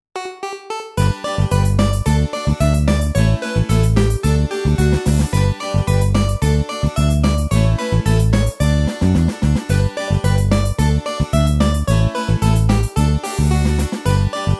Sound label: Karaoke